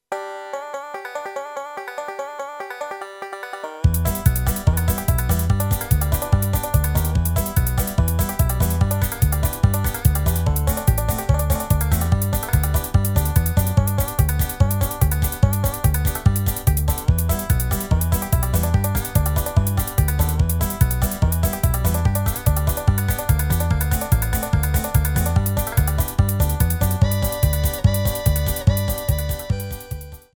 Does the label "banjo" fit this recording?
Yes